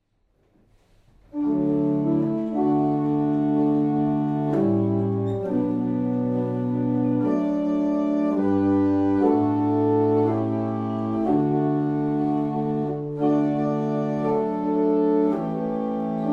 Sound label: barrel organs; Steeple; Manchester